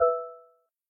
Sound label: button; shadow fight